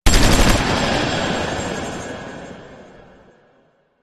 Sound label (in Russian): oruzhie vistrel ocheredyu s effektom otrazheniya